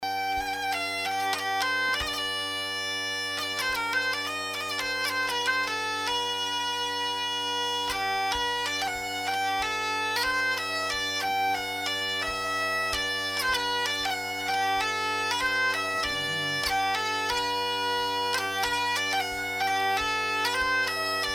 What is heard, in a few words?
mini g tune, vielle; hainzl